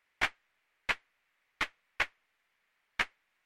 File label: a100 clap